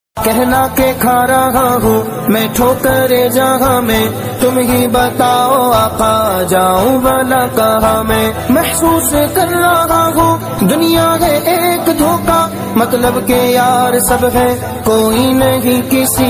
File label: Naat Ringtone